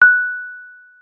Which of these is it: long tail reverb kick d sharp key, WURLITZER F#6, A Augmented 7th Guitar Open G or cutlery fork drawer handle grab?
WURLITZER F#6